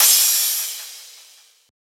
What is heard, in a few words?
Crash